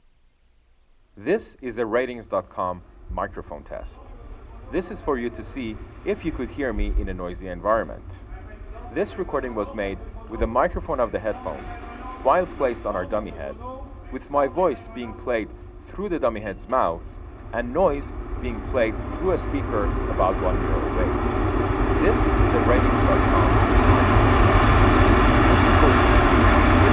in line subway

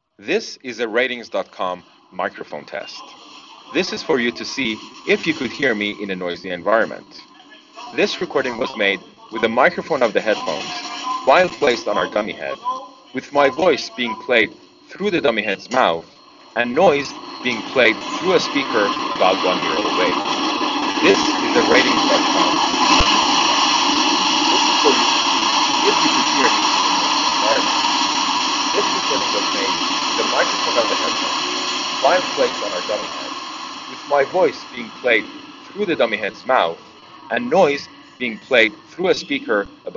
active subway; elite 4

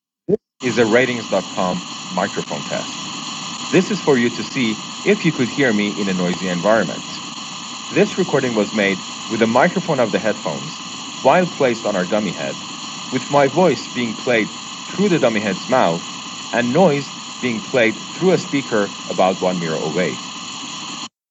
phone pink noise

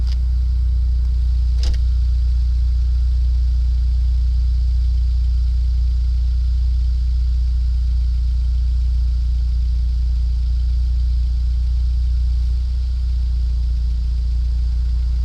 street

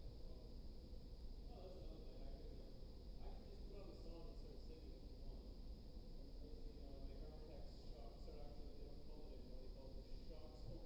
office

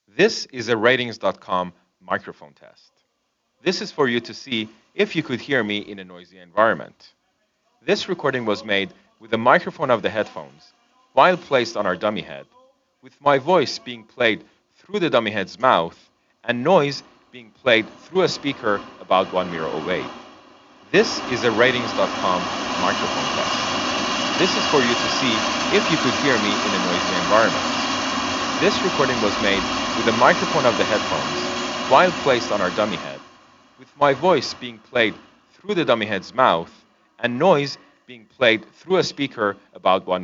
subway max noise gate

